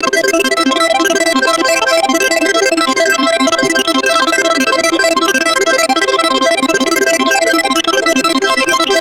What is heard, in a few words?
rapid electronic slot machine spin; game